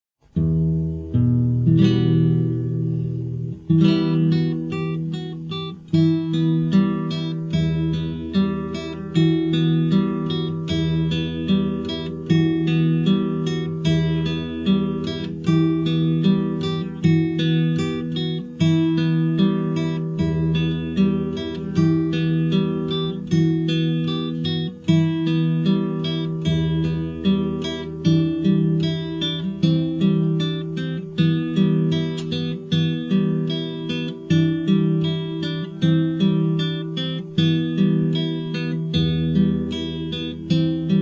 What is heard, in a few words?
etud mi minor